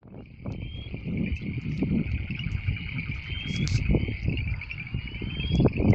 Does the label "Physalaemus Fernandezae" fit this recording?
Yes